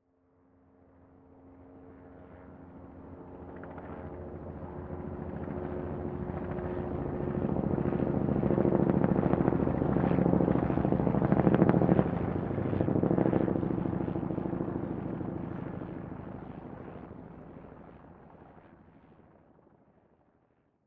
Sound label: Helicopter Fly Overhead; News Distant; Helicopters